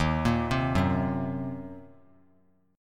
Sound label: Eb Minor 6th chord; arpeggiated